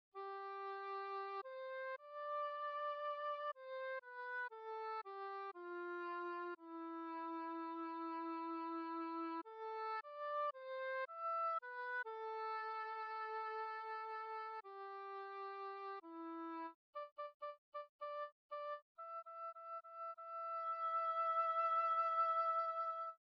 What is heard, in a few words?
oboe